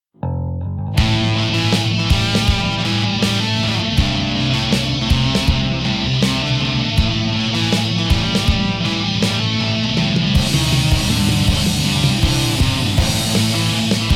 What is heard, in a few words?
riff